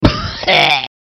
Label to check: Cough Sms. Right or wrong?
Right